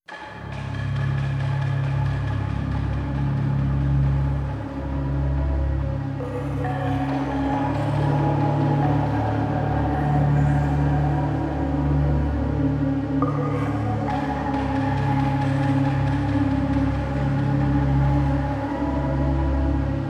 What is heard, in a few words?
Gamelan; Angin Kaulu; Kena Bridge of Spirits